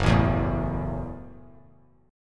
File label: Gb Minor 7th down; strummed; JGuitar